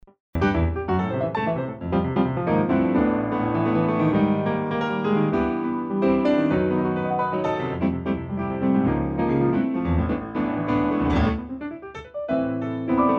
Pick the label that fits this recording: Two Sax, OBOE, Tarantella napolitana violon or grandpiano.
grandpiano